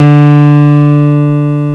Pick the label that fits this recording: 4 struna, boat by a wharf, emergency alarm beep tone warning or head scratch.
4 struna